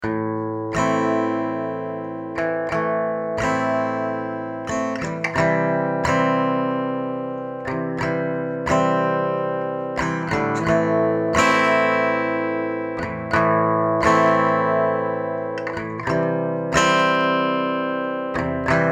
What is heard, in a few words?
C Major and A minor 90bpm